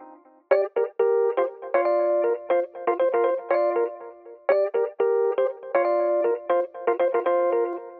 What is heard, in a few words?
El Piano 120bpm Cm, Nu Disco; Zero-G; Construction Kits; Legacy